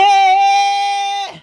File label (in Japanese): 地声ブースト系; ガナリ発声; ２種類のガナリ発声とは？